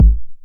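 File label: 909 BD tape, kick drum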